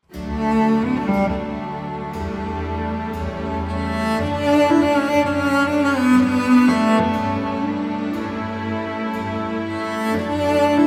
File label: cello; from The Mission